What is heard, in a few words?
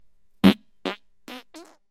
fart silent deadly